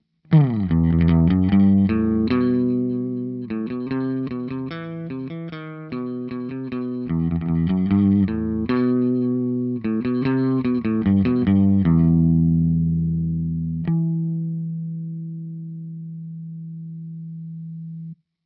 Surf Rock; TwinR; ReTube Custom Shop

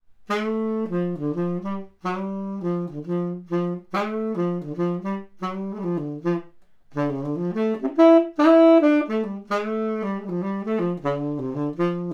Spaced Omni Sax; Four couples of microphones have been placed in front of some instruments

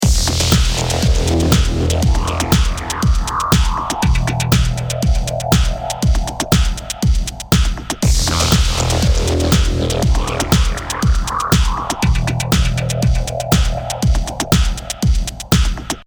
Industrial Drums DRY; Grasso

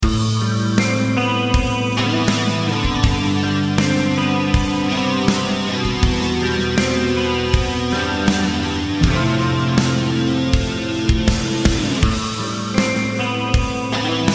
80s Guitars WET; 80s and rock music

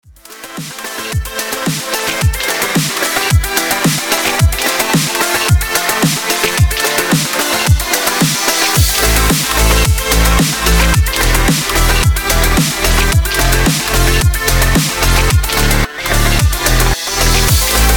Wake Up Snare Dry

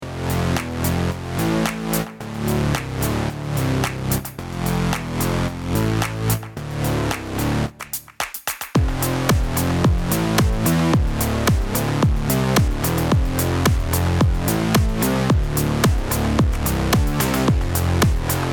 Synth WET

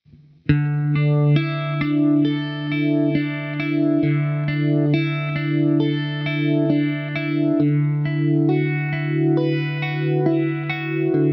Uni-Vibe

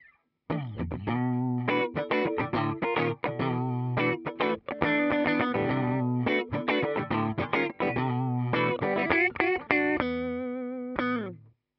DComp, Clean Electric Guitar 1; compressor; One of the most sought-after guitar compressors, with its typical “twang” and strongly frequency-dependent response.; Deluxe1

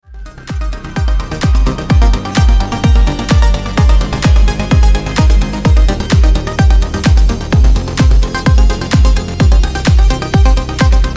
Synth Pop Mix DRY

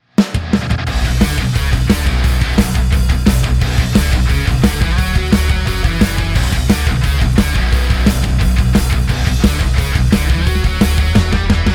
Metal Drums WET; Grasso

